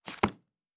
Book; Survival Game Sounds - Lite Edition; Objects and Items

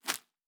Leaf Run Surface, Footsteps; Survival Game Sounds - Lite Edition

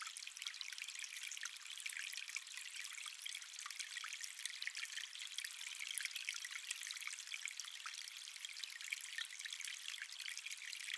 Water Stream Calm; Survival Game Sounds - Lite Edition; Environment

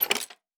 Metallic Gear

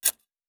Soil Impact; Survival Game Sounds - Lite Edition; Environment

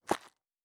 Dirt Walk Surface, Footsteps

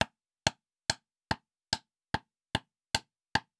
Nail Wood Loop, Crafting; Survival Game Sounds - Lite Edition

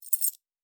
Keys; Survival Game Sounds - Lite Edition

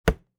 Wood Chop; Survival Game Sounds - Lite Edition; Crafting

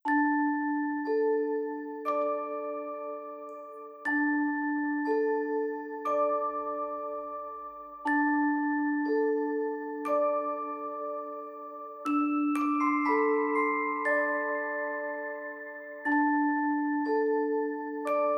Light Ambience, Fantasy RPG Tracks; Free 25 Fantasy RPG Tracks Vol. 1

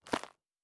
Dirt Walk Surface, Footsteps

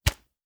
Arrow Shot; Survival Game Sounds - Lite Edition; Combat and Hunting

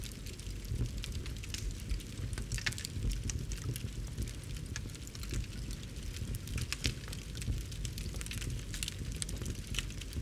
Fire Burning Loop; Survival Game Sounds - Lite Edition; Environment

